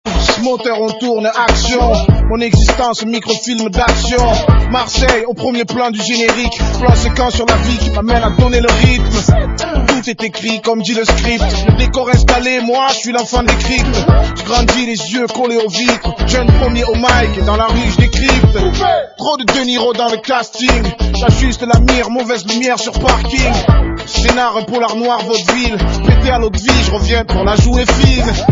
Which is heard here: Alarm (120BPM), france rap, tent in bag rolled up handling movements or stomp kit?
france rap